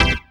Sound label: dance chord